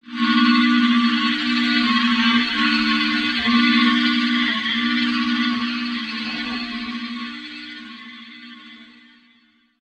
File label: pitched event